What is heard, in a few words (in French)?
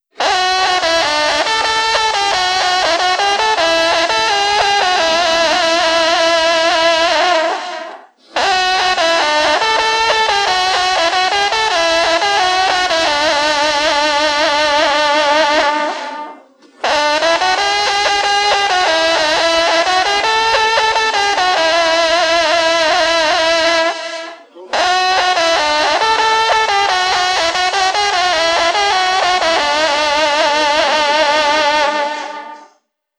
Trompe de style Musique de venerie